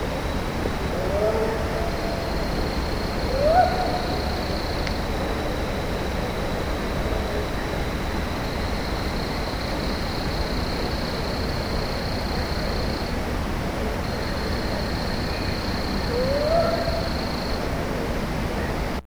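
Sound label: whoops 2nd location, Possible Bigfoot vocalizations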